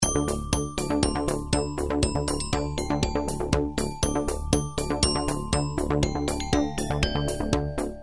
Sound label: Inspire, ringtones; Okapia; Spark